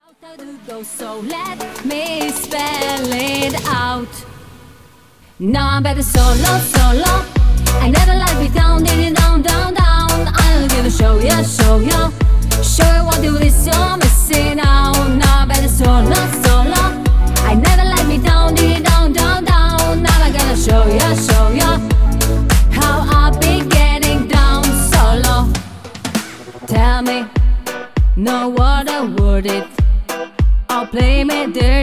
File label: Solo